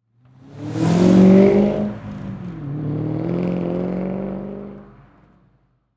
Transportation Car Race Car Drive Off Loud Exhaust